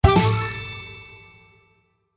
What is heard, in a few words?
Small Win; Beat the Bobbies 2